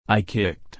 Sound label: kick